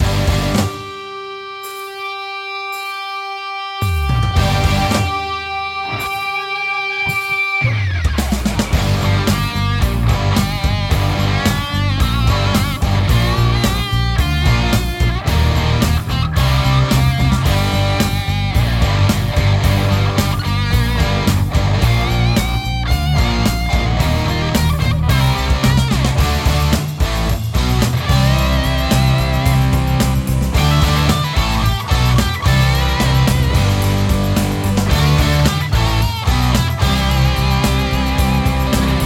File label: Air Guitar Intro Theme; EyeToy Play 2